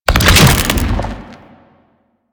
Octopede Head Spin; Warframe; Man In The Wall

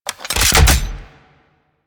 Rifle Reload; Weapons; Warframe; Thanotech

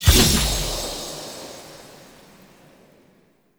Blood Lust Impact; Powers; Fey; Champion

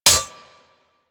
Melee Sword Impact Generic

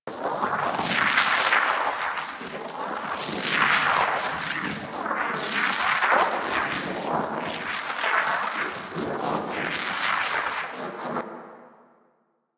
Armillary Sash Loop; Warframe; Nezha

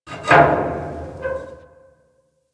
Derelict Metal Clunk Squeal; Ambience; Props; Warframe; Orokin Derelict

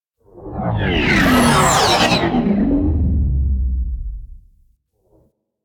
Sentient Ship Close Fly By; Warframe; Enemy Ships; Space Fighter Sentient Coil Agent

